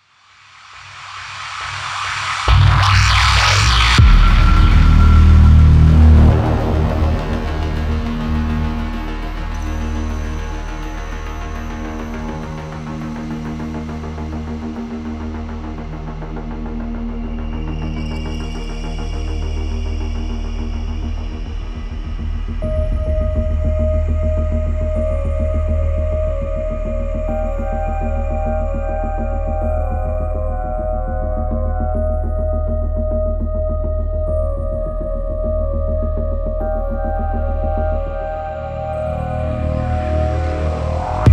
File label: Base Main Ambient; ARC Complex - Samuel's Base - Main Ambient 05